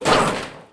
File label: catapult die; propack